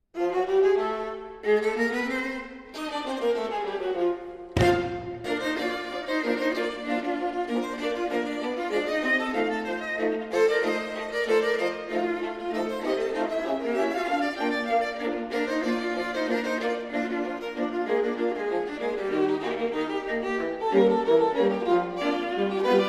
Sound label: Ragtime Dance, viola